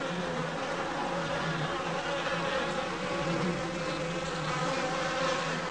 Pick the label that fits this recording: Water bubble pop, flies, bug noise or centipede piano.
flies